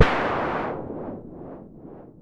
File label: Synare 3, Filtered Noise